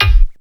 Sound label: Kick, Ensoniq ASR-X Pro